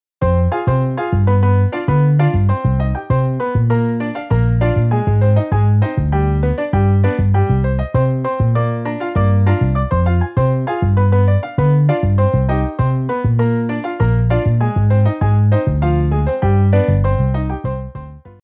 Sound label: Piano Bass MIDI